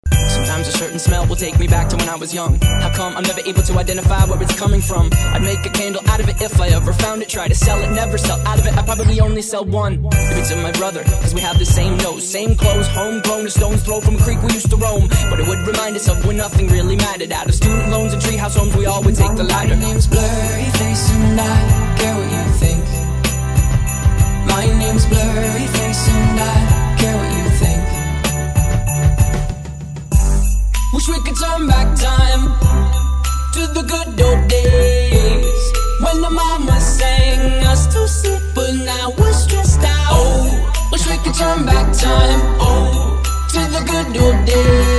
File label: Christmas Mash Up